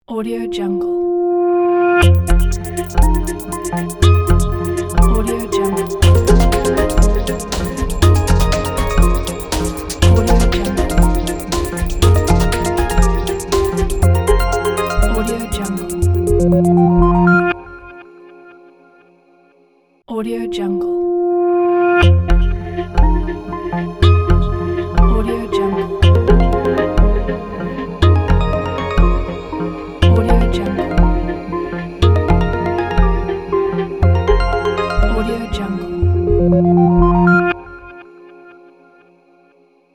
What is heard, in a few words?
Dark Tech Logo